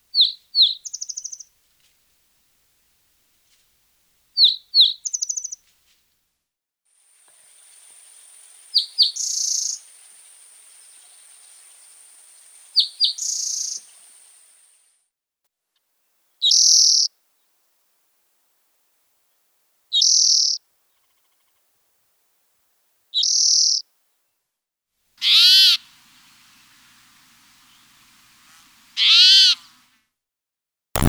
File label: Spotted Towhee